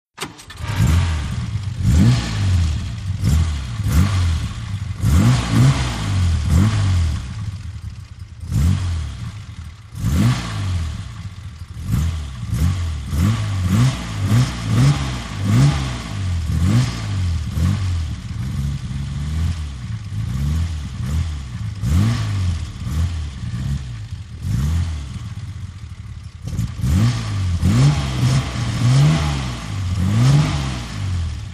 VEHICLES ASTON MARTIN: EXT: Start, rev, switch off